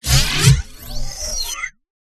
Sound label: LIGHTMECH; PMSFX